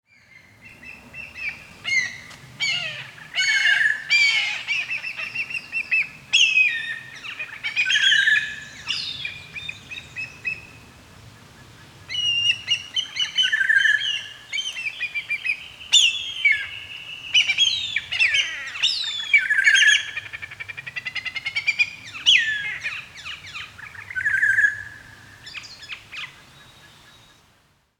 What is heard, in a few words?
Haliastur Sphenurus; Whistling Kite; Call; Call 2